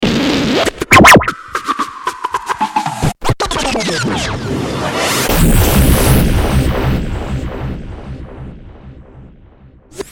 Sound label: Scratch